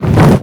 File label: wing flap heavy